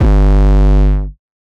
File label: 808 Bass Sizzle G3; Slate 808 Deep Dive; SD Signature 808s